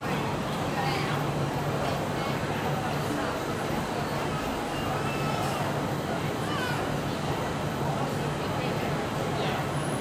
Singapore Ambiences - Telok Ayer Market, Lau Pa Sat, Busy Food Court, Large Crowd Walla; Soundtuts; Neon Lights; Ceiling Fans